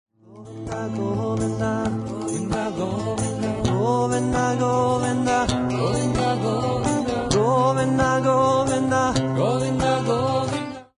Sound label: Govinda Gopal, Guitar